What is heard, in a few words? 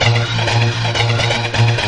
loop